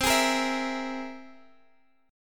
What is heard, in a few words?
C Augmented 7th, Guitar Standard, chord; strummed; x,x,10,13,11,12; capo=2